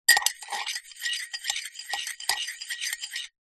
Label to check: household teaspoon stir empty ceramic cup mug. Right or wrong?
Right